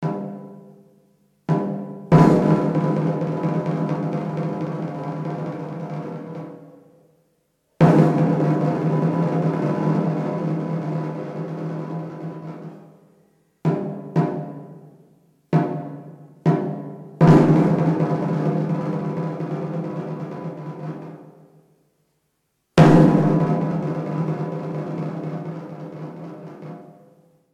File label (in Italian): Tamburo; CORO DELLARCICONFRATERNITA DEL SANTISSIMO CROCIFISSO E MONTE DEI MORTI DI SESSA AURUNCA